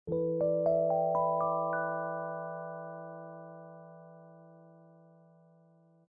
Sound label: Electric piano; Piano app by Yokee